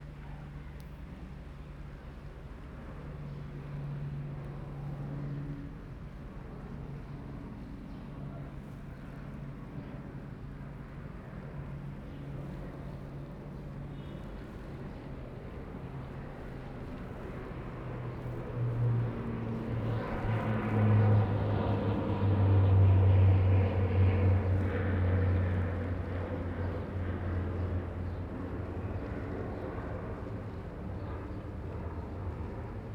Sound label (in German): Am Tempel, Boudha; Kathmandu, Nepal